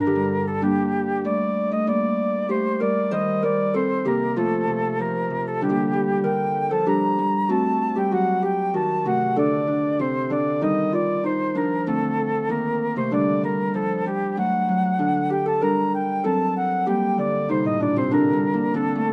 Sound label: for flute harp; la mariee veuve